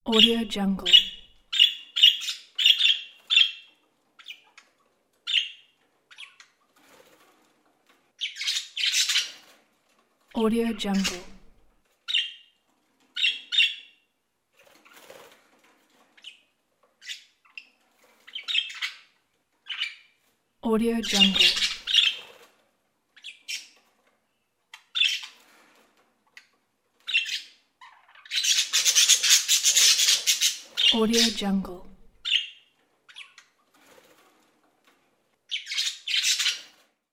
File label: parrot